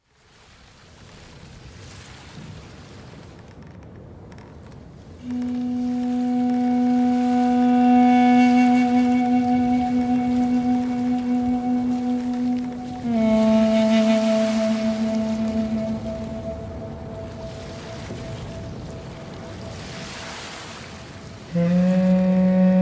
ocean waves

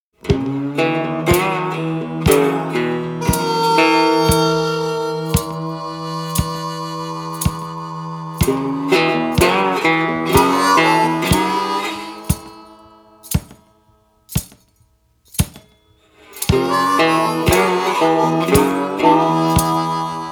blues box breakdown

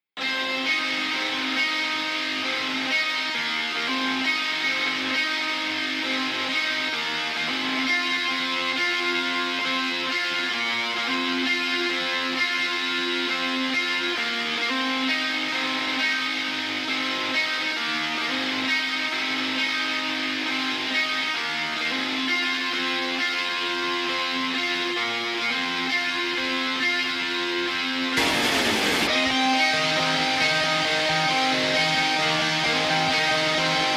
liberation guitars

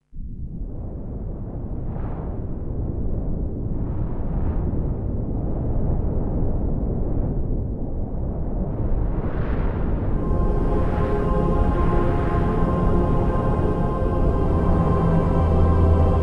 ambient

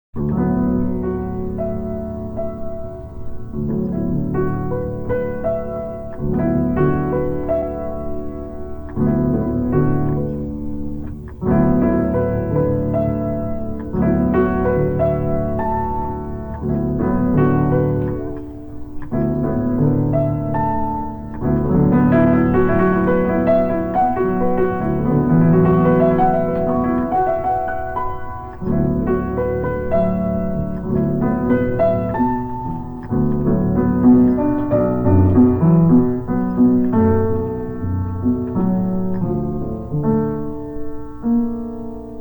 piano interlude

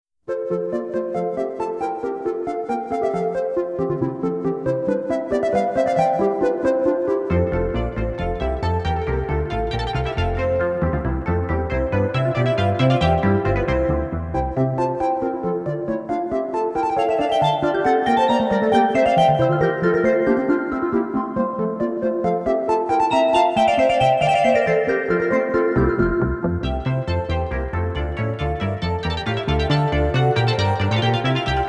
water music bourree

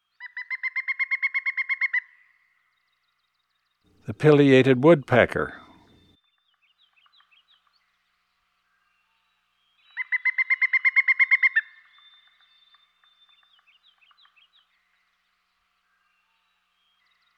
pileated woodpecker; dan gibsons solitudes

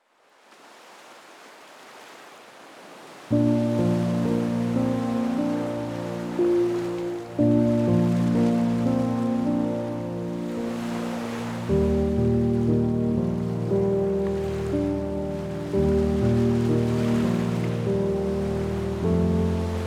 piano, deep sleep baby beach waves

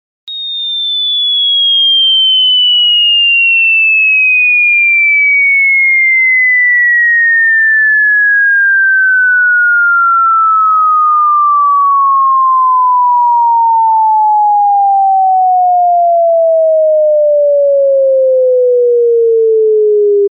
4k to 400 5 db

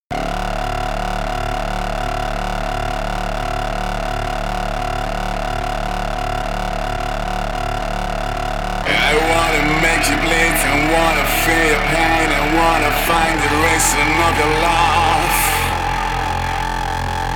minimal noise distortion mix

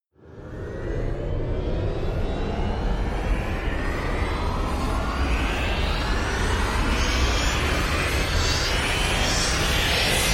rise long twister

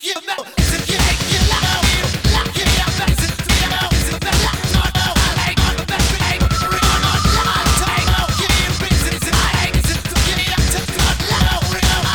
more perc no bass

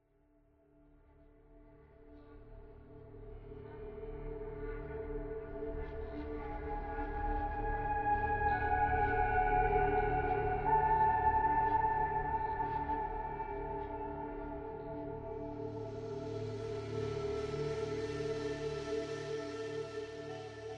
ambient

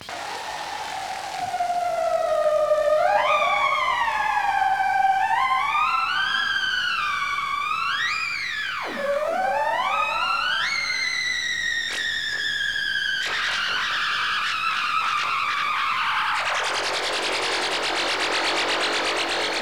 keyboard solo

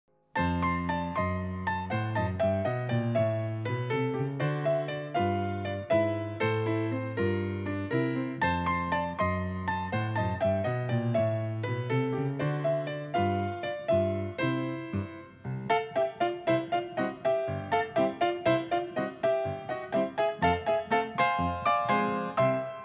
boat piano

